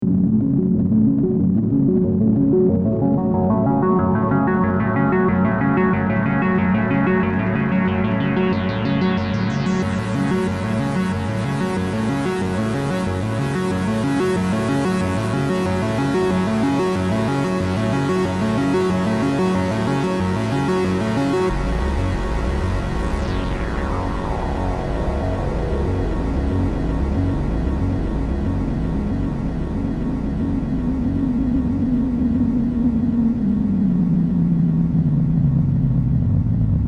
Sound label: amb